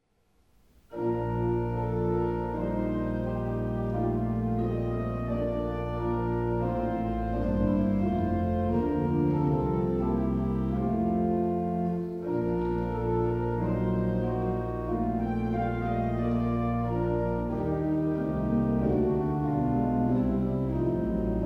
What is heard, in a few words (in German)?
B Dur, Orgel; Orgelschaetze; Wechold; Brosig